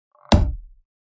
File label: unleash arrow